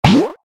Eject over

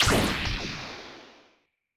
Card Played; battle suit aces; Pilot

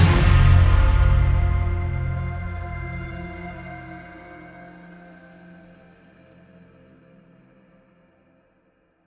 Battle

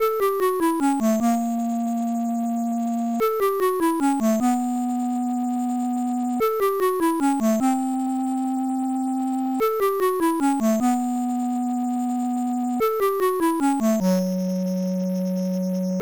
Clarinet Solo; Clarinet Solo 2; Amiga; gamerip; dylan dog through the looking glass

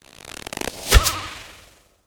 Skel Archer Throw

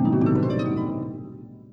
secret jingle; batbarian testament of the primordials

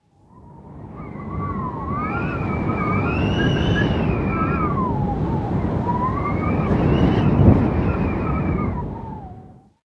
Whistling Wind

